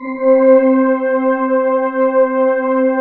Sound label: PAD C4; Best Service Gigapack 1